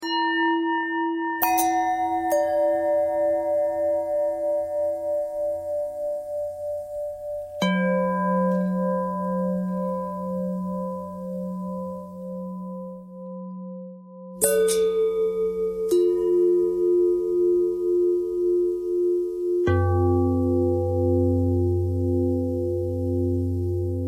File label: long bell bell thread pentatonic, bells